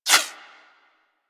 Laser Whizzby; Warframe; Weapons

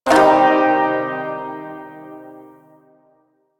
Shawzin Pent Min Chord D, Music; Chords

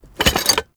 Smithy Metal Misc; Soul Plane

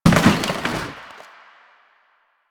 Janitor Cart Impact; Warframe; Ambience; Nineteen Ninety Nine

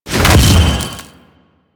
Projectile Fire; Enemies; Abilities; Sentient Brachiolyst

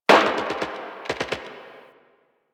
Distant Gunfire

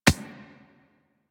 Beach Ball Bounce Dirt

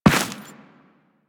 Run Dirt, footsteps; Warframe; Teshin

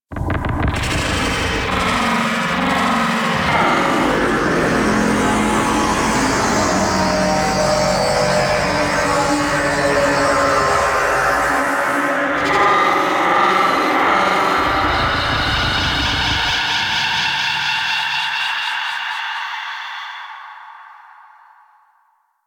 Vocal Warning, Enemies; Warframe; Teralyst; Ropalolyst

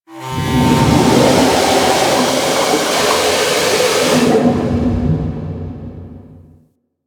Ocean Steam Blast Large; Warframe; Grineer Ocean

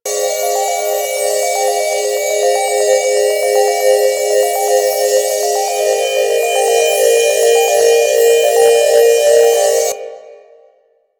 Modifier Item Pickup Alt Loop; Warframe; Nineteeen Ninety Nine; PvPvE

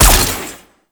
Weapon Fire; Enemies; Sentient Dolicholyst

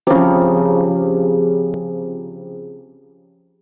Prime Shawzin Phrygian Chord D; Music; Warframe

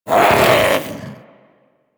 Vocal Attack Short, Hunting Critters

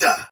Pain Soldier Sword Agent Male, Battle; Sinecure

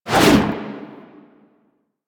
spin whoosh; characters; generic foley; Warframe

